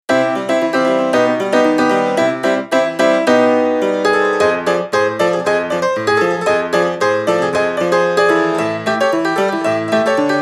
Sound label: Nord Grand Honky Tonk